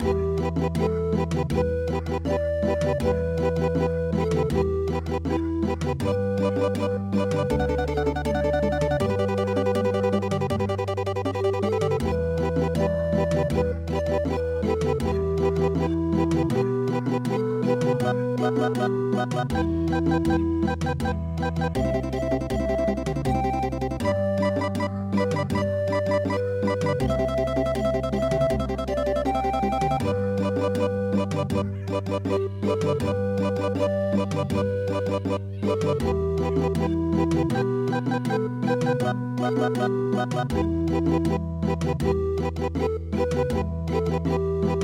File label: Andes